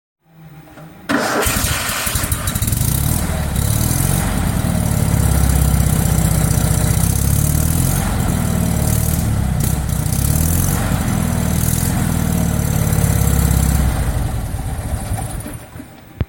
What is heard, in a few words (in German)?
fiat 500